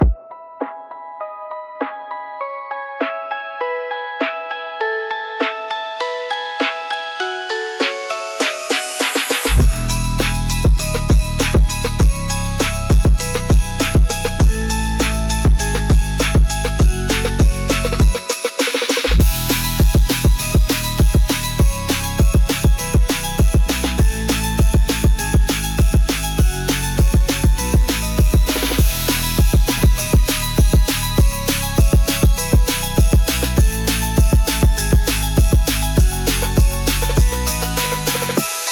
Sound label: Christmas Phonk